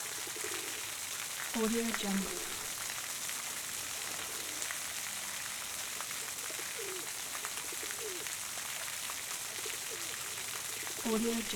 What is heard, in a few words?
city park pond with birds and fountain loop